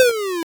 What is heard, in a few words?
shoot, attack sound; カタナ１